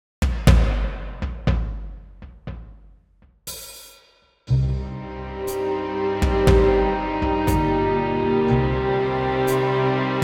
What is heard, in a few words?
Intro Cm Ab Fm G